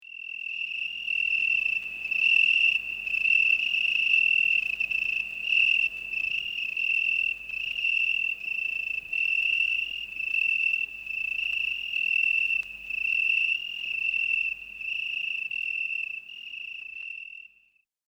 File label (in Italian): grillo, Insetti; Monte Pisano; Animali; I Tesori del Monte Pisano - I Volume Gli Animali